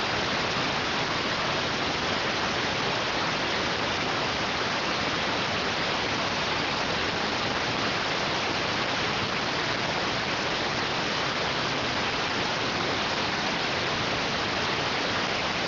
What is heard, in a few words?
rtty rx